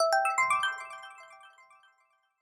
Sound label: 1-Up